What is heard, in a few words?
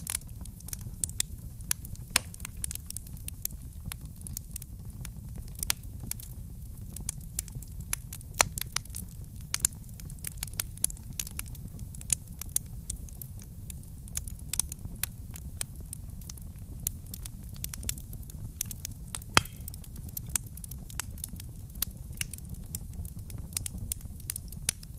campfire